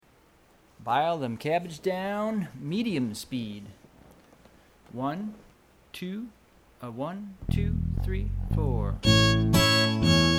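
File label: 120 bpm Violin Guitar Bass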